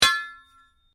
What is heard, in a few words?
Strike Metal On Metal Heavy Ring; Foley sounds for melee weapons; Metal Sound Effects; Materials